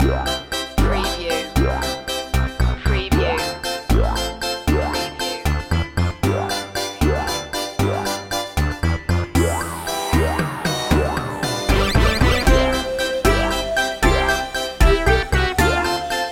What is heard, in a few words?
retro video game circus